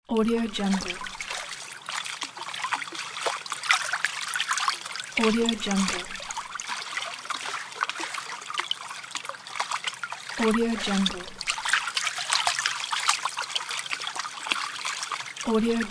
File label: pleasant splashes of waves; djsapa